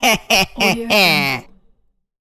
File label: cartoon laugh